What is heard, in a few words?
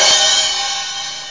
Crash Cymbal; drums